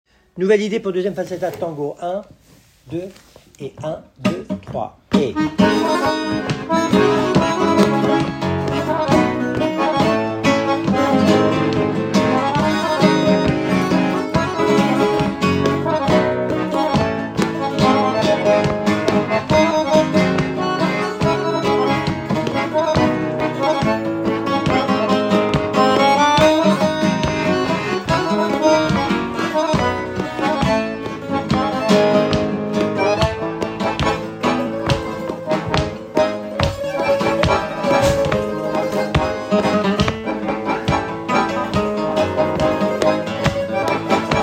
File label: falseta tangos, flamenco